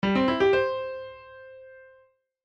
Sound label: Game Start